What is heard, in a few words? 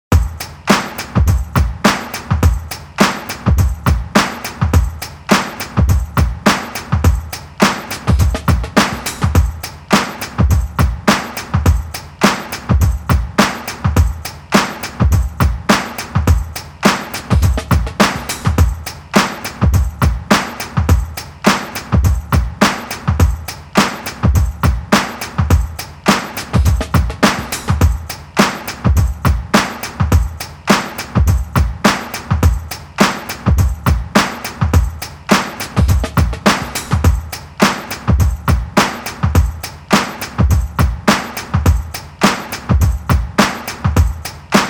beats bpm 105